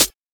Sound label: Closed HH